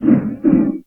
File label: sling big miss; wesnoth